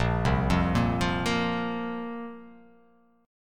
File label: Bb Suspended 2nd, Bbsus2 chord; arpeggiated